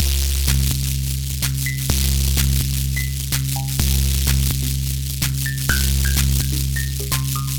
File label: TEST TUBE LOOP, STRANGE MACHINE LOOPS